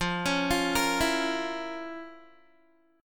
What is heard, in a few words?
F Major 7th Suspended 4th Sharp 5th, Guitar; FM7sus4#5; 13,16,15,15,16,x; 4E,4A,5D,5G,5C,6F; arpeggiated